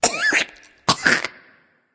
nv clear throat; smite; voicepack; ratatoskr

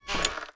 door; Smite; Baron Samedi

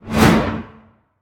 angelic basic swing; smite; thanatos

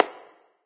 clap; Smite; Zhong Kui

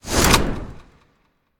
pwr attack; serqet; smite

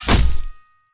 spear attack hit; smite; god odin

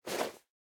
Clothes; Smite; Susano

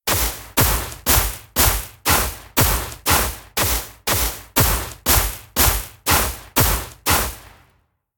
pwr hit; smite; gilgamesh

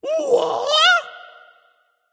surprised; smite; tyr

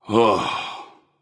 sigh; smite; voicepack; merlin

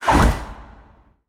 Swing; Smite; god Kumbhakarna

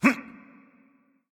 hitreact human male small

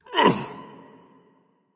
grunt death; smite; npc; olympus

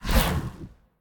swish; smite; tiamat

